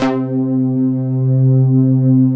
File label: pan plucks, synths; Pro-Rec - Synthsonic